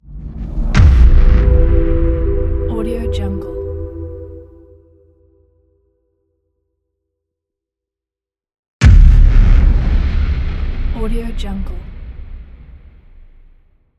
opener impact

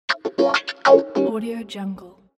funky guitar chord

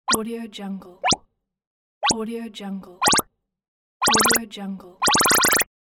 plop

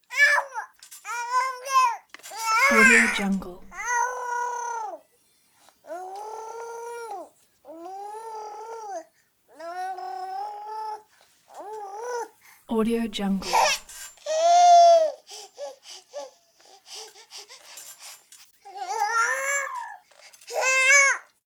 baby voice cries wail gurgly screams breaths; prosoundfx

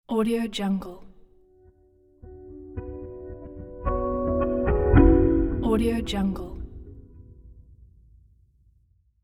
touchable gentle logo

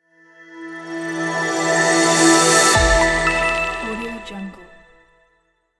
media logo intro

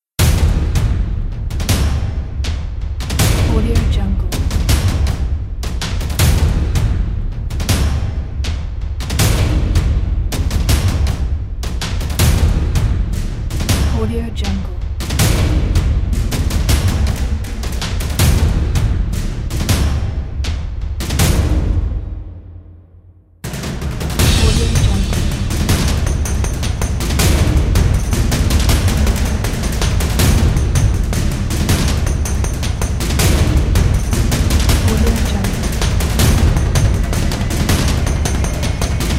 epic drums

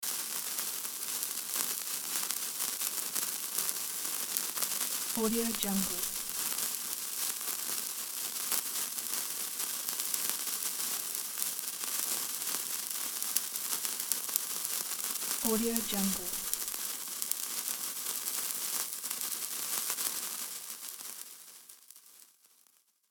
sparkler

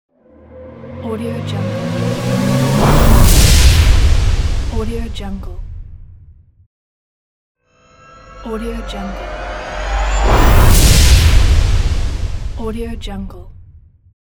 jet crash

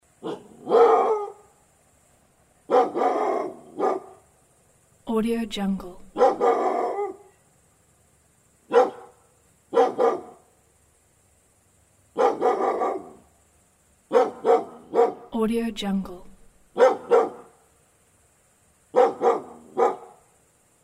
dog barking in the evening countryside

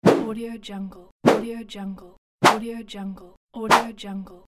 whoosh; absinthemedia

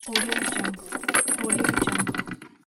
chain mechanism old gates

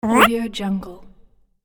little character cartoon effort

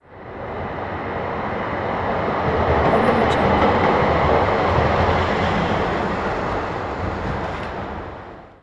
tram passing by

